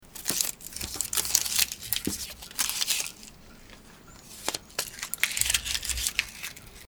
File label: scissors